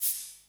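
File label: percussion, Drum & Bass; Ueberschall; Drum & Bass Resonance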